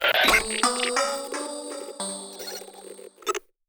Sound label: ringtone